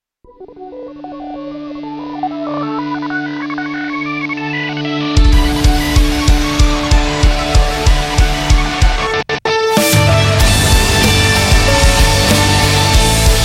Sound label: Instrumental; 例大祭15; Instruments Track